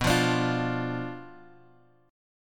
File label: B Diminished B, guitar; Bdim Chord {X 2 3 X 3 1} strummed